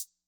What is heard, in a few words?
hihat; pasific; Pop